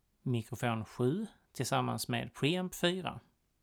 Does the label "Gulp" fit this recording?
No